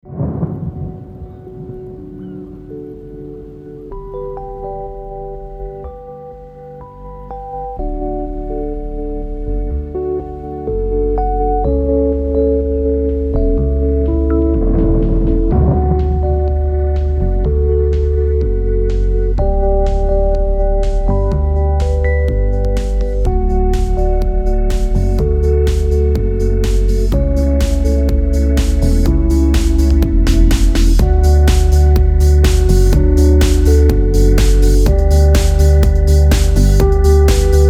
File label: Lounging in the Rain; Muzik 4 Machines